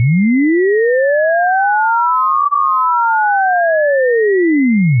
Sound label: chirp; noise